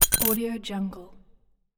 light metal fall

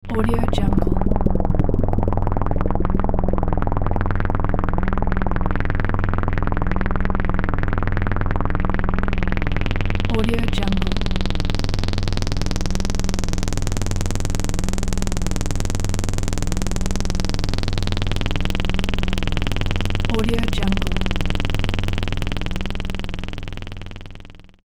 low drone texture with oscillating warble; sound ideas

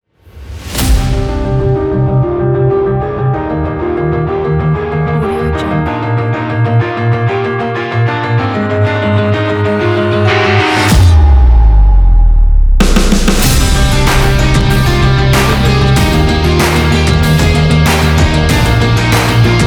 ukrainian energy rock

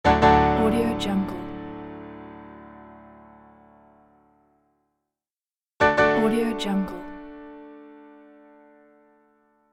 tada piano

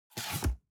object box cardboard open unfold scrape short

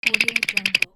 teeth chattering

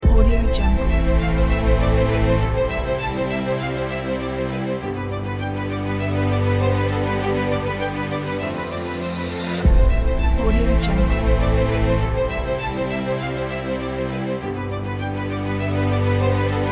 dramatic inspiration pack

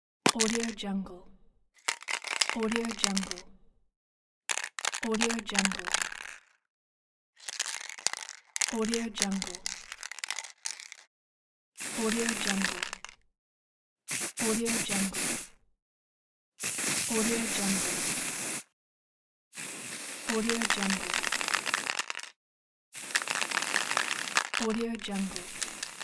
spray paint

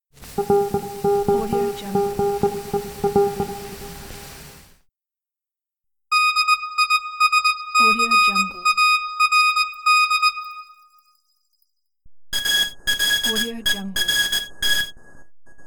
encoded in morse

